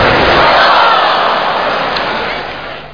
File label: ball out